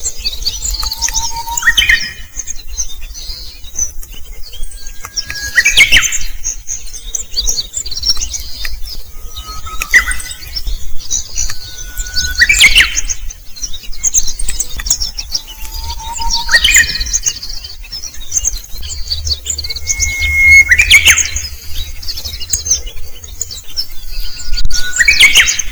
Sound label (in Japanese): 前山寺のウグイス